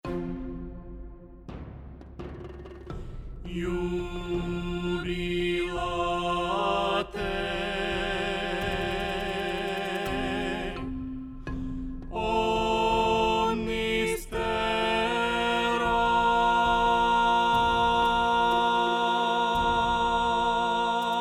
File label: Soprano